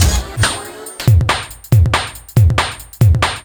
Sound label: Break Beat, Drum N Bass; Sonic Foundry (Sony Creative Software); Knutrix Drum & Bass